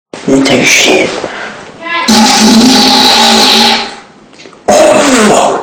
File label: big toilet fart